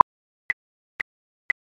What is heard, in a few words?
click